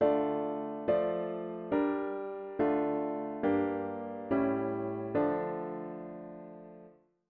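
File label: diatonic inverted V7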